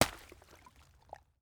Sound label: Liquid Water And Ice Impact